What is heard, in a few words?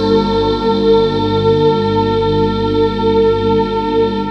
Pad, 6A; Jungle; Blu Mar Ten; Jungle - 1989 to 1999